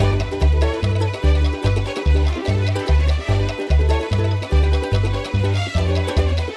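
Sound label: ocean world music shooter no guitar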